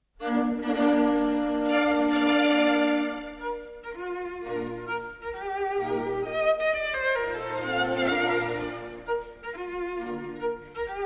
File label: Cello Concerto in B-Flat Major, Rondo. Allegro; Boccherini; Arr. Grützmacher; G. 482